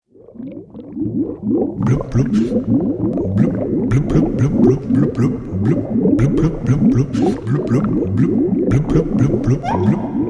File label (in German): Beatbox